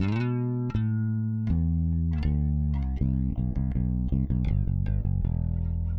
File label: Flange Bass; Rock